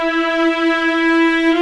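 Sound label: viola E4, ARCO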